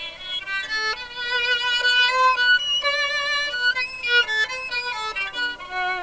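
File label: sul ponticello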